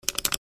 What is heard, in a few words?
Apple magic mouse double click